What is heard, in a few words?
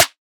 Snare F#; Cymatics - Astro Mojave